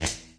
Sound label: Spark A